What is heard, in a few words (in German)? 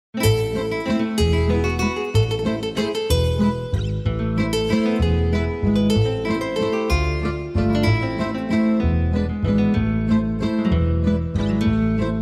Gipsy Waltz nur Begleitung